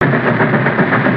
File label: train